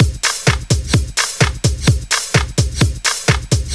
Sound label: House Beat, 128 BPM